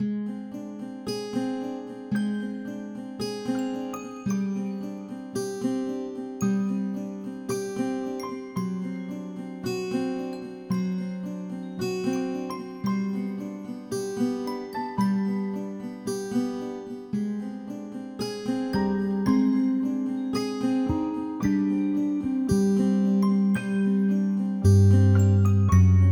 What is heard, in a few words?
Shelter Dialog Helpful; Dead State